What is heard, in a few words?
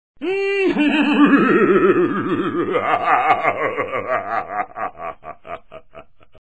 HAHAHAH; Haloween; Rosegarden